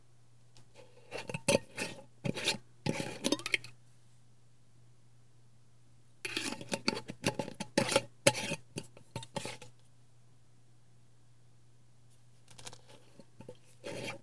open close jar

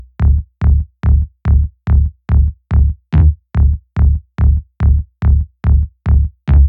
A Synth Bass Loop Mono; Sirius

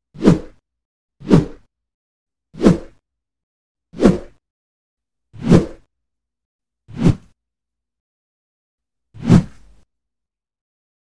Intense Whoosh Sounds

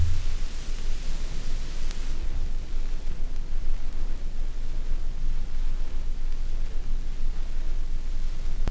Vinyl Hiss

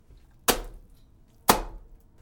Water Flops

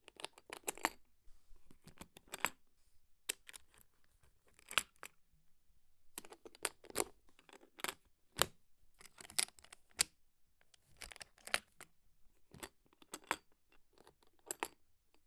Locking And Unlocking Of Guitar Box Locks

